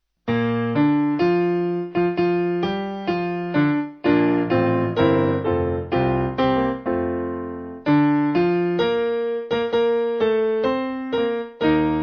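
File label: Piano, church music backing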